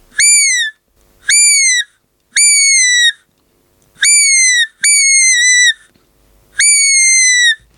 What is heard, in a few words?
T2 Black Reed, 3D printed fox caller